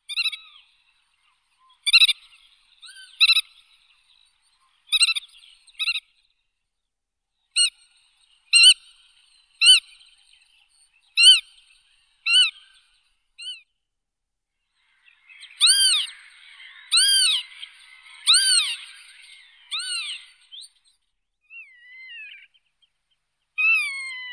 veretennik bolshoi limosa limosa

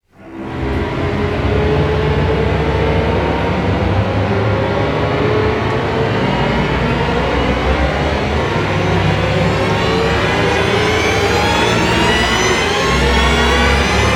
volume rise live

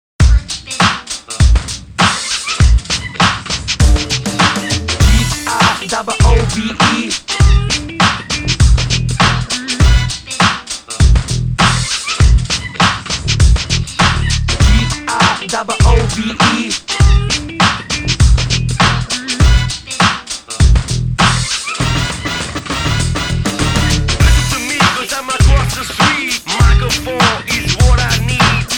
groove with vocals

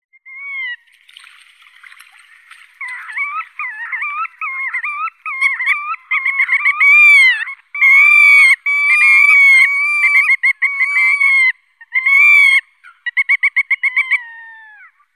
veretennik bolshoi limosa limosa

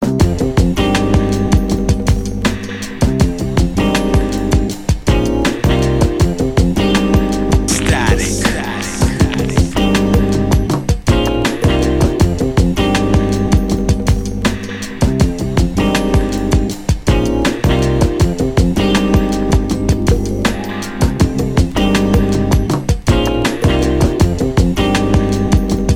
interlude instrumental

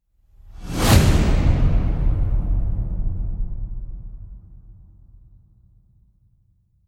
slams with whoosh; conquer

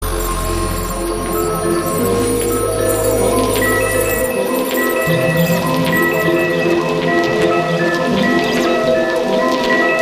ambient mix

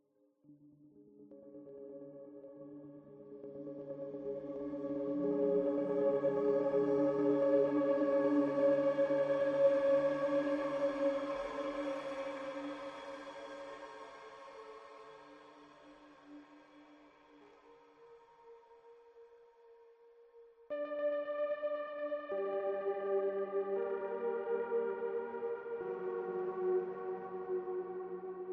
ambient

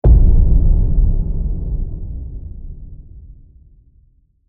carnage hit sub; Ninja Tracks

